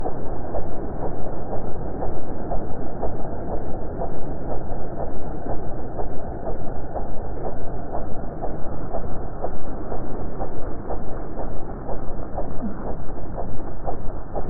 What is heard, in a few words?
Portal Distant